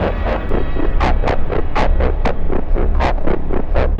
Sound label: Dirge bass, Funk; Sonic Foundry (Sony Creative Software)